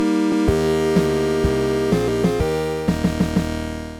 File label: win tune